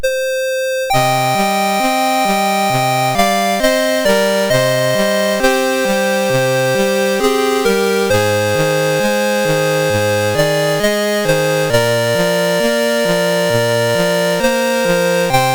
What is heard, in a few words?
Fields are Bright, MOS6581R4